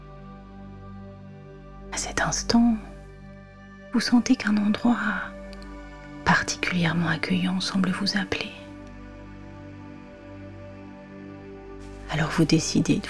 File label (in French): seance musique